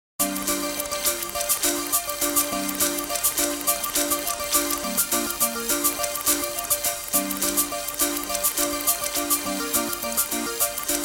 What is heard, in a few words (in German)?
Weltklang Minimal; Bizarre Planet